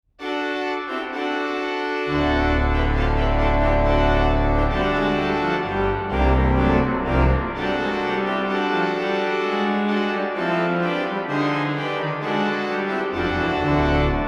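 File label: fanfare, organist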